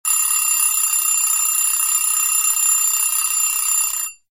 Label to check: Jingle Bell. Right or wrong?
Wrong